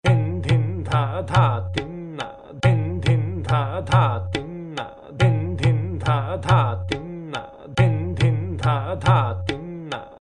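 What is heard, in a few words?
tala dadra, tabla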